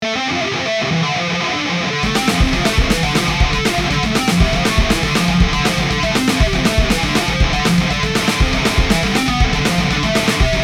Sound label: drum rock